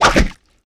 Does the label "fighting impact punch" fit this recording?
Yes